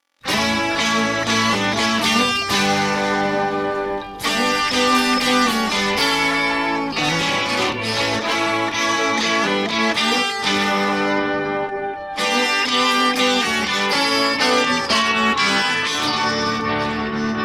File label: Guitar; song stems